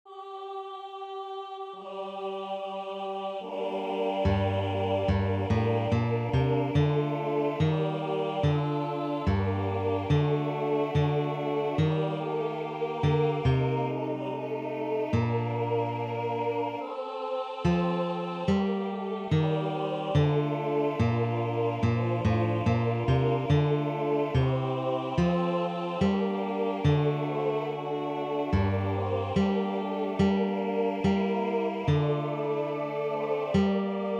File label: Goudimel ps 23 basse; Psaume 23